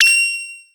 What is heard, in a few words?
Single UI Beep